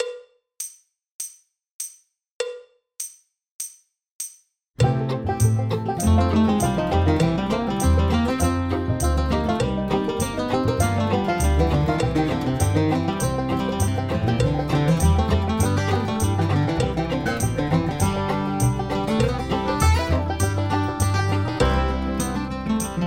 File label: Drum Kit Boogie Playalong; Grade Two; backing tracks; Drums